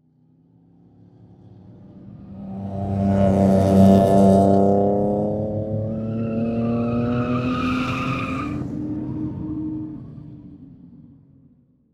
Transportation Car Race Car Drive By From Between Cement And Metal Muffled